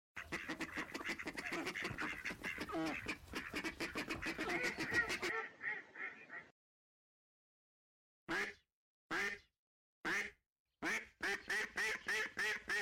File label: Male and female migratory ducks; مرغابی های مهاجر نر و ماده